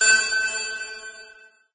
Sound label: amb random tech; source engine; portal2